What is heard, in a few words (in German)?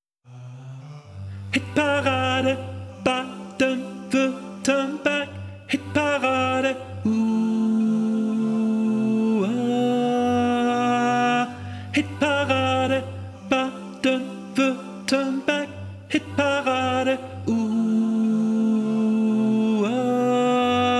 Jingle Tenor; SWR1 Hitparade